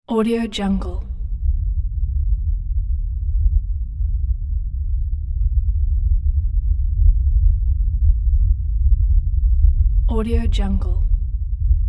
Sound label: low stampede rumble